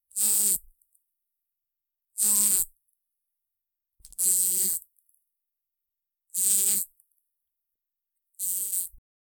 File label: multiple single insect